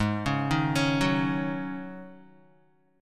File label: Ab 7th Suspended 4th Ab Guitar 4F,4A,5C,5G,5C,6E; arpeggiated; guitar chord; 3,4,3,6,6,x; JGuitar